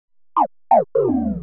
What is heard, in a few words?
HOUSE FX 125